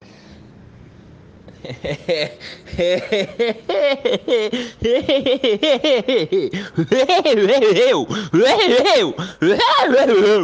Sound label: hehehoheu